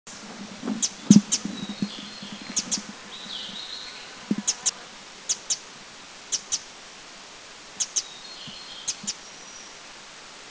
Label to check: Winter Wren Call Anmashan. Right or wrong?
Right